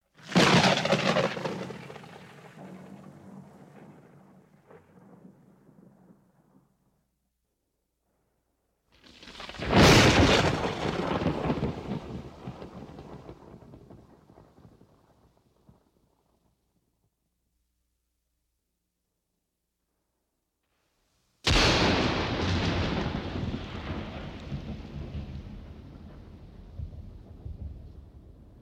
Thunder Claps Lightning Strikes Storm Cracking

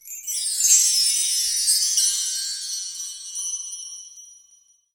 Hi To Mid

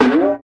Spring

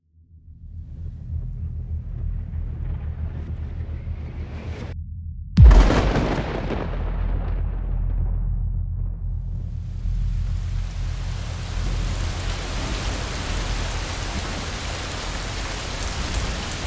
Thunderstorm Ambience

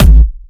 Kick House Kick

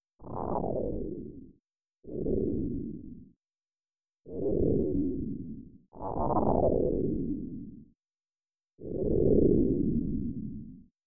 Hungry Stomach

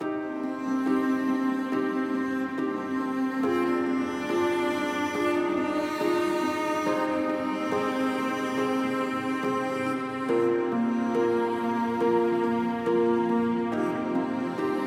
Long Autumn Loop

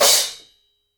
Crashes Mix

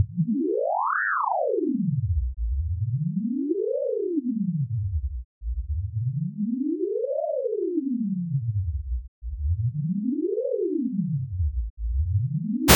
Wavy Synth

